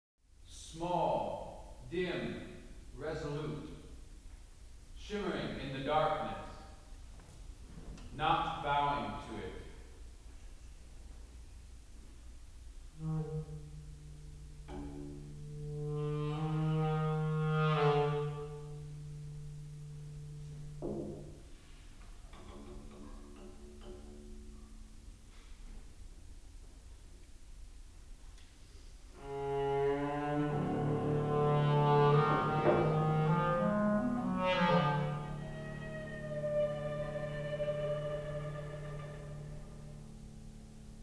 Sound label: piano trio